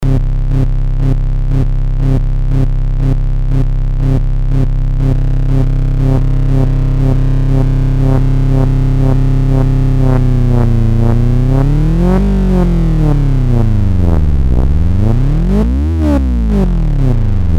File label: LFO Modulation